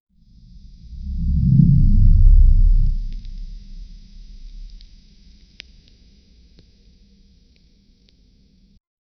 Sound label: ultra realistic organic, body horror